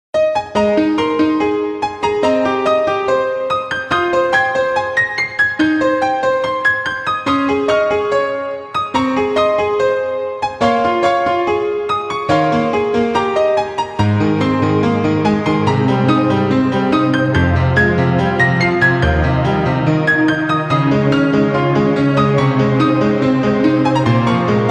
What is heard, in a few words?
Handz Up Techno